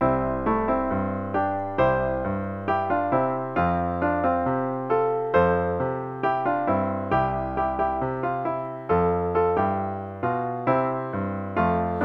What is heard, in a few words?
Vocal